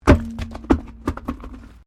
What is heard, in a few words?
WRECK WHEEL ALLOY DROP, Wrecked Cars; Wrecked Cars, impacts, crashes, scrapes; Crash Sound Effects; Crashes (Sound Effects:Objects)